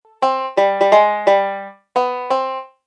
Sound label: Shave And A Haircut